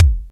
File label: Bassdrum, Roland D-70; Drum Samples